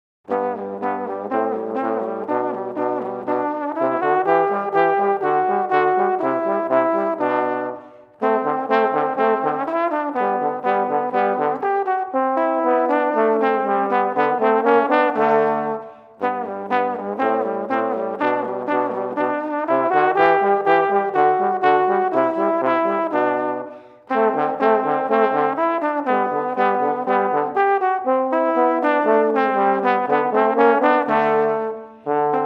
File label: Andantino Two Trombones